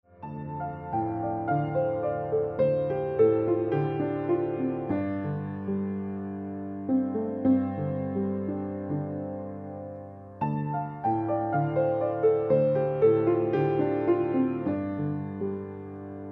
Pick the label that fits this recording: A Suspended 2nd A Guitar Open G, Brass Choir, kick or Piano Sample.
Piano Sample